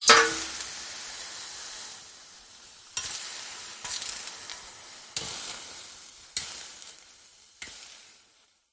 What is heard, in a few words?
liquid water ice drop hot metal sizzle burn; zapsplat